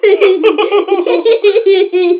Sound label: babies; OT-757